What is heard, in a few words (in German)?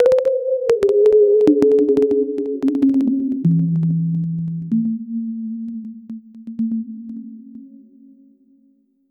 erstelle chimes magic; musical instruments